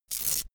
velcro open cap quick

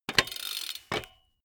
bmx bike pedal grip freewheel

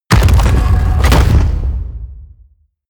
mechanical lever stone large down

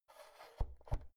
oven knob press out soft

